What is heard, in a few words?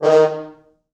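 4 French Horns, Horns; D#2; East West; Quantum Leap Horns Sax; 4 FrHorns MOD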